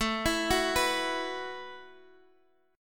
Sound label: F sharp Minor 11th, Guitar